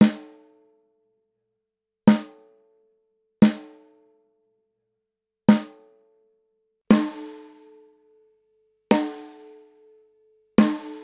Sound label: bubinga 13x5, snare drums